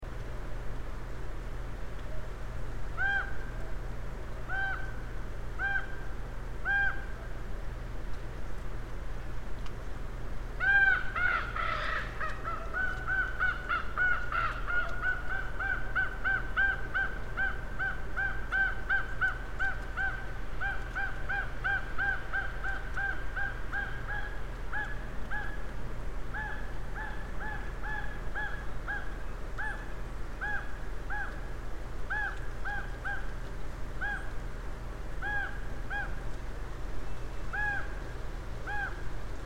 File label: ravens